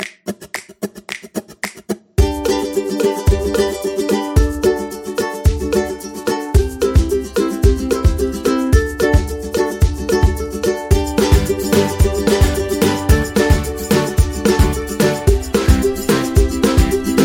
Happy Summer, happy music

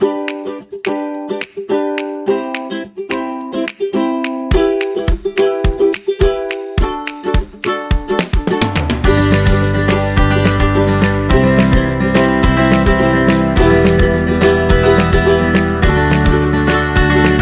upbeat ukulele guitar; happy music vol 6

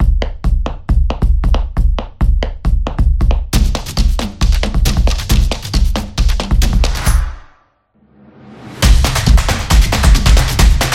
Percussion Intro Fast Opener; drumming action

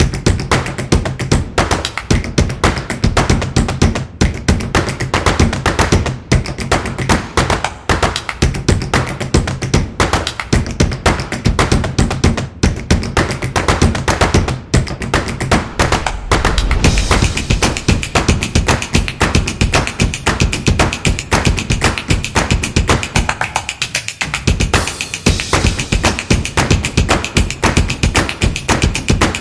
Explainer Video, energetic percussion

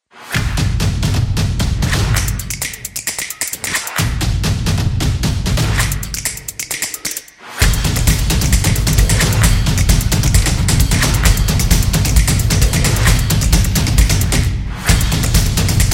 Drums Energy

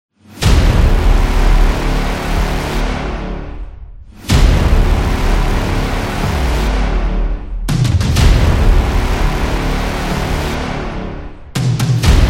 Countdown Music

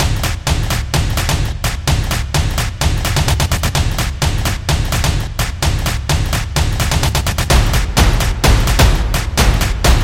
Drums

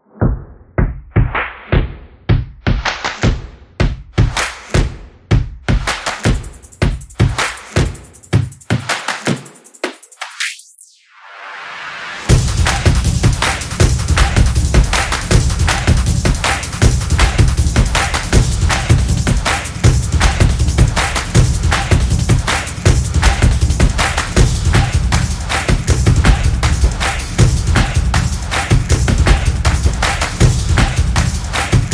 Drum Clap Stomp

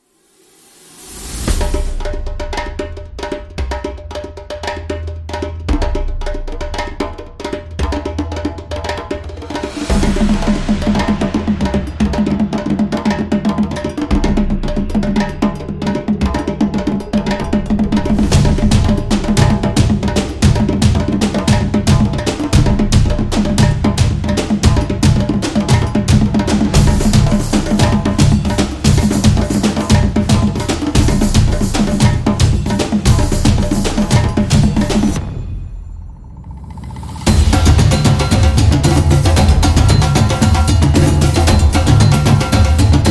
Africa Tribal; epic drum

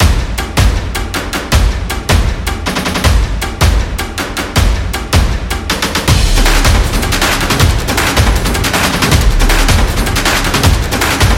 Action Aggressive Trailer; sports

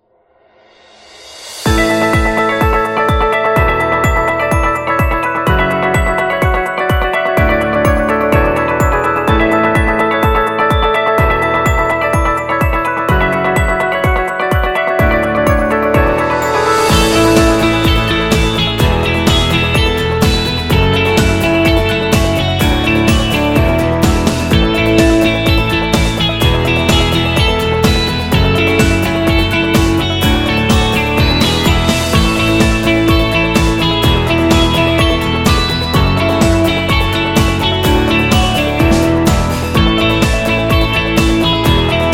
Upbeat Corporate Rock; corporate music vol 3

